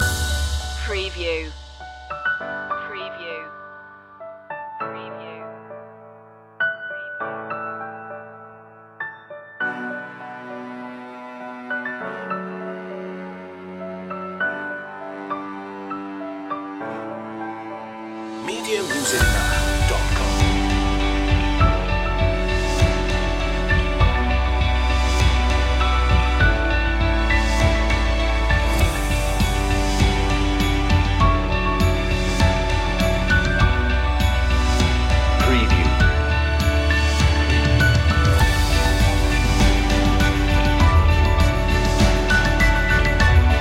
the seed of life no acoustic guitar

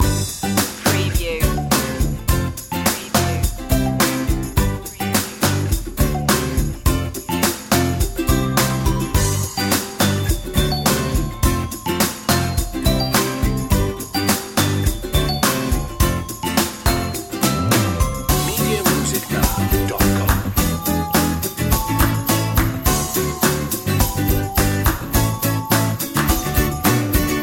easy and fun loop

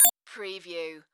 Multimedia Beep

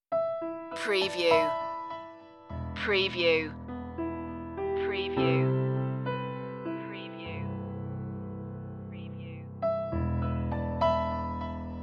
piano only version

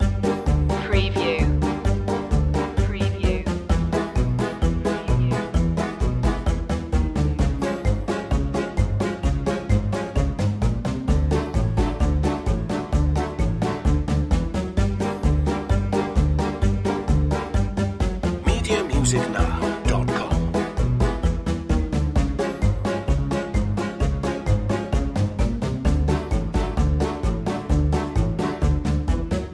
quirky comedy loop

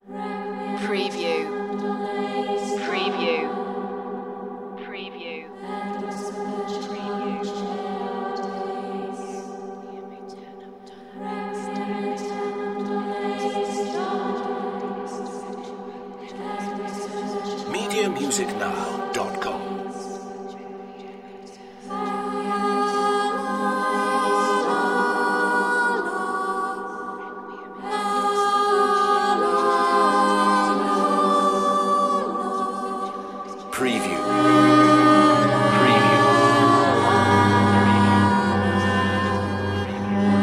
celtic requiem chant mix

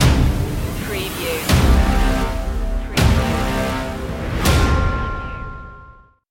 epic tense action stinger

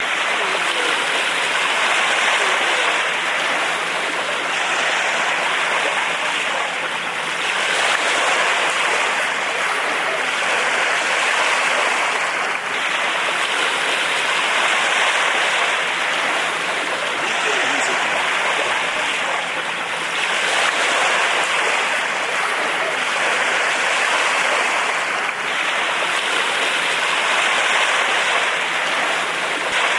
Sea Smooth

